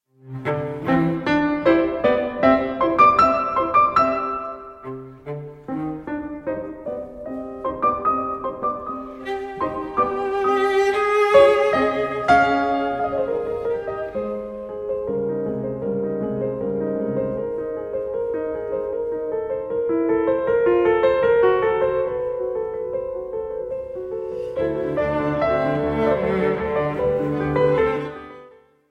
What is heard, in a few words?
sonata in g minor op 5 2 for cello and piano 2nd movement rondo allegro; Ludwig van Beethoven